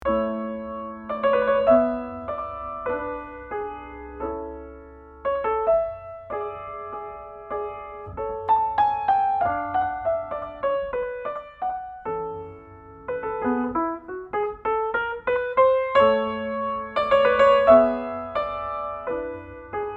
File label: Sonata in D major Adagio